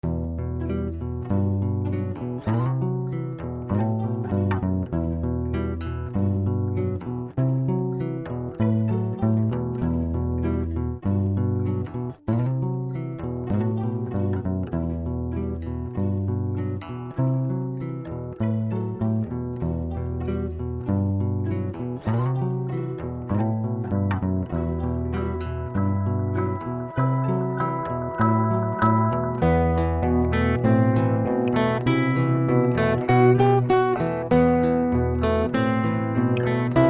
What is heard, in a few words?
Music; fungus; TheHunter